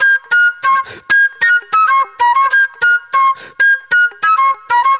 NY 70's Jazz Flute

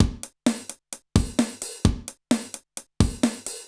Alesis HR-16B (130BPM), beats; musicradar; cyborg beats

